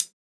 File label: pop hihat; japanese pop